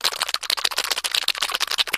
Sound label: wet cheek flappy